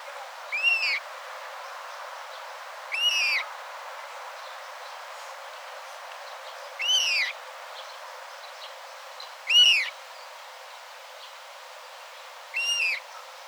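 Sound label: Gallinula chloropus juvenile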